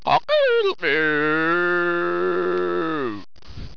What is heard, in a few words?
moo